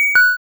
boss prehit

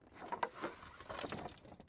skeleton walk

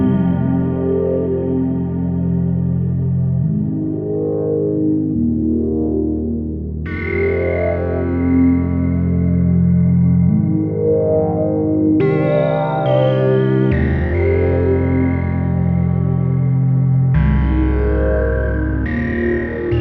smg fm loop

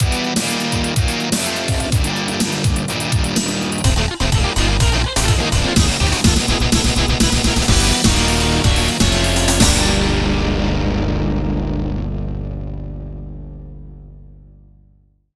so mad

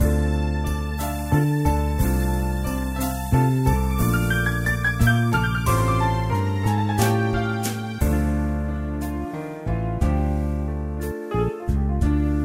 Blues

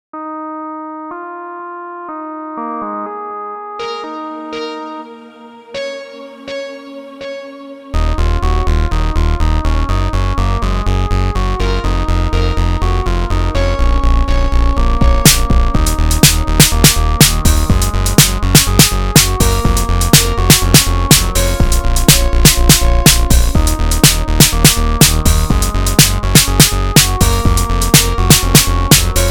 summer pop; vintage collection vgm sfx tracks stuff vol ii

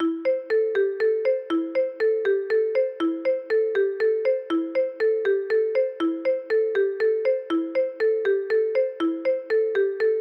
marimba

